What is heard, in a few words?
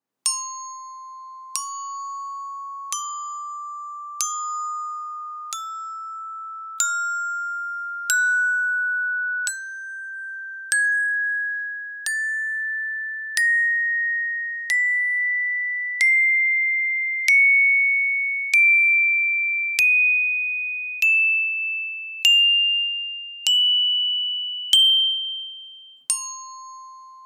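20 tones Chromatic Glockenspiel with wooden club; auris; アウリスグロッケン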